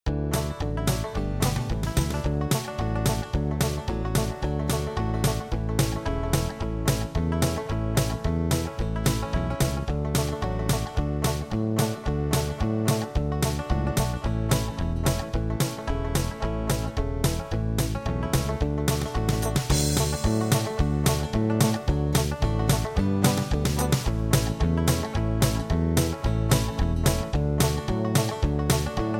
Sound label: ragtime annie uptempo